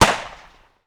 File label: mauser